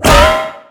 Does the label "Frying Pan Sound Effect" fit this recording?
Yes